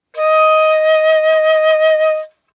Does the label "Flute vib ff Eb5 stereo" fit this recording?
Yes